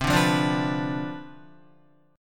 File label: C-Major 7th Suspended 2nd Suspended 4th Guitar, CM7sus2sus4 chord; strummed; JGuitar